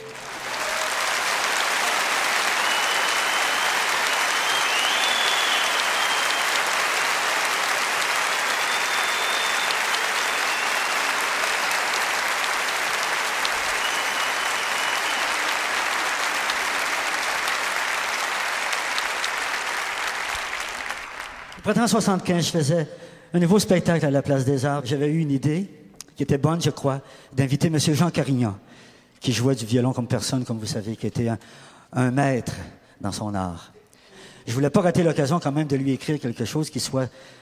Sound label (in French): Applaudissements Et Présentation